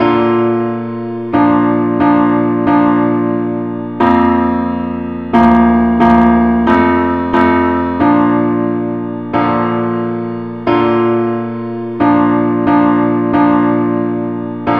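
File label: parts only key B flat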